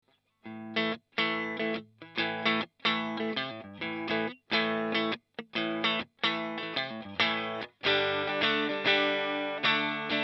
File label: clean rhythm TV